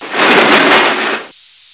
elevator door

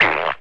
splat, cartoon

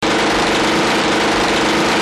uzi loop; weapons

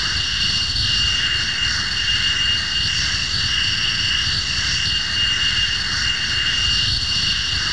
engine, aircraft engines

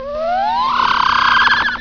alien laser, scifi

